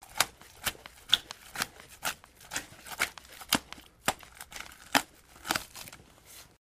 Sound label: Cutting Up Carcass, gore